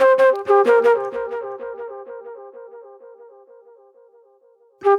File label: NY 70's Delay Flute